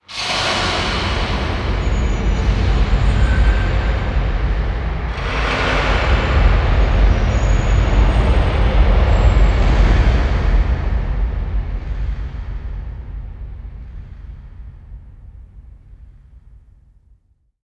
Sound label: Pillar Reset Wet